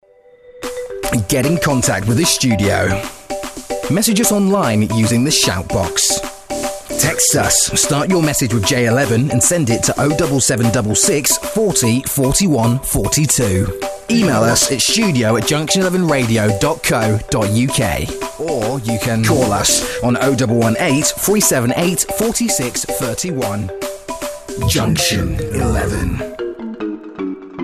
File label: radio contact jingle; junction11